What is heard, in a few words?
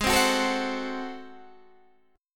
Ab 7th Flat 9th Guitar Open D, chord